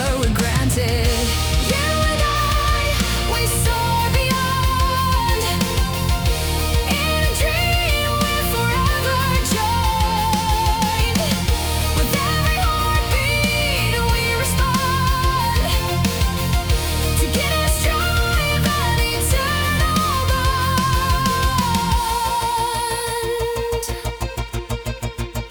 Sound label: Melodic Metal Version